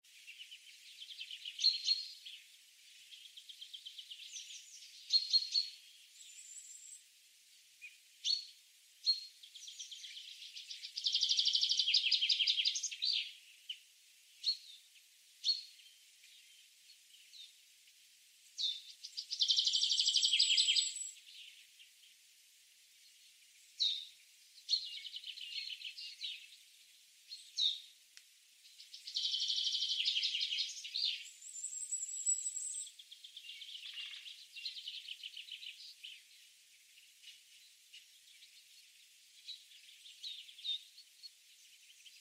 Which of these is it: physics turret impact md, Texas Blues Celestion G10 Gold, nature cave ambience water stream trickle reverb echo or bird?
bird